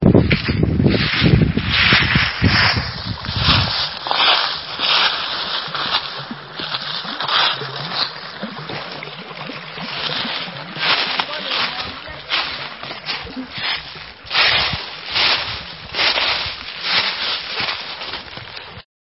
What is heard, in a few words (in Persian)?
sedaye barge paeez, autumn leaf rustle